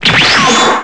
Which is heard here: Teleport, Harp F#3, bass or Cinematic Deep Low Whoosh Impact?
Teleport